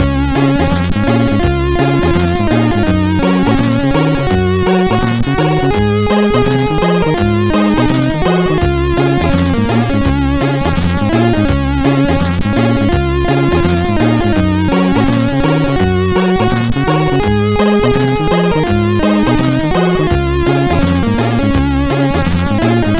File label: On a Reggae Trip, MOS6581R4